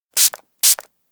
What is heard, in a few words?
spray bottle; anemoi